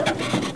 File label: SQUEAK; Matrox M3d